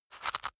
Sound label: rice grind